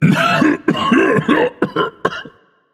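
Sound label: non-verbal clear throat; smite; atlas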